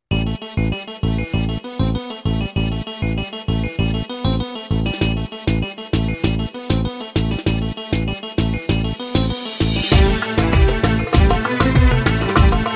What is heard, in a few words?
Oriental Pop